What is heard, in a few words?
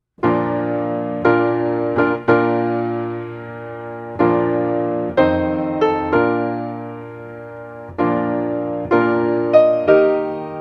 here the bride, solo piano; wedding music